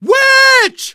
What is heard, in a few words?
Witch Warn; L4d